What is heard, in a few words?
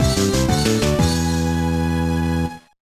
Unknown Jingle; one piece; gba